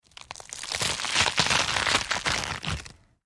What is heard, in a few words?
Lettuce Crunchy Rip, kitchens